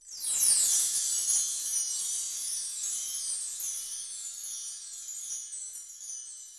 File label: Instrument Chimes Quick Soft; Vrodge; laser kitty pow pow switch gamerip 2019